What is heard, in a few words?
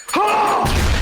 scream; gus fring